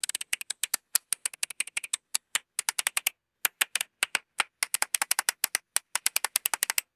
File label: close up plastic button clicks; foley